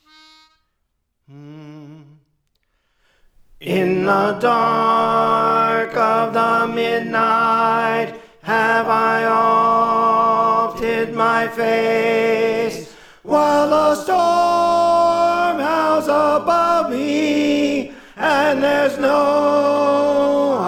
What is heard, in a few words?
Tenor; Praise For The Lord Training